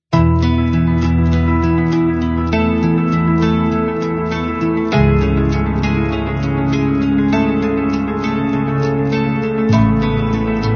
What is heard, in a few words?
Cinematic Emotional Uplifting Inspiring